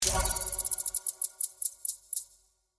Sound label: ticks